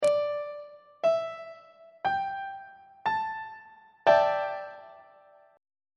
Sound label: g double sharp dominant 7th suspended 4th 1st inversion on treble clef